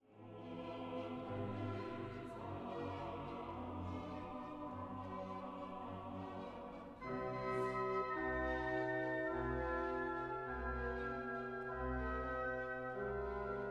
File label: Gloria Ending cadence